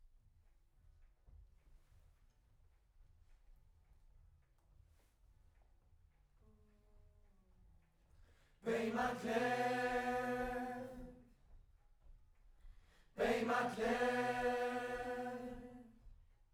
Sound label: Choir Far